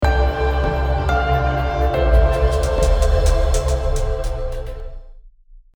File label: Emotional Sub Pump; Axel Wayn